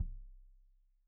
Kik Stereo; Deeply Sampled Drums; SMDrum

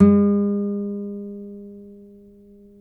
NYL NV A, nylon no vib guitars; Spectrasonics; Hans Zimmer Guitars VOL-1

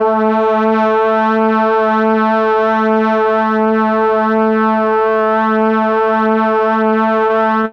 brs a2, Brass, MELLOTRON; Retro Sampler; Brass + Flute

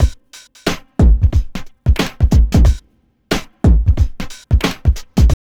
TRIP 90, trip loop

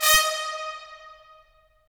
BRS, Tps Stacc; Roland - LA Composer vol. 4

